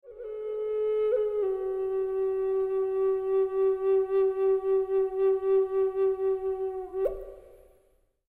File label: indian, indian flute